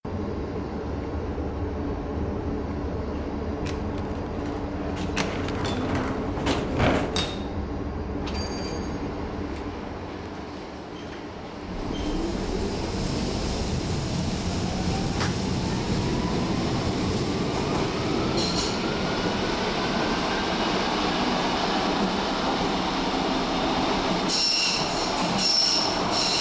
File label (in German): U1 Wagen Mitfahrt Sound